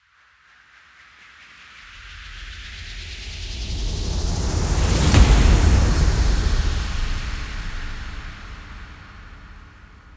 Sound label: Transition